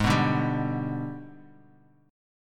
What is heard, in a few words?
G sharp Minor Major 7th Flat 5th, Bass Standard; strummed; JGuitar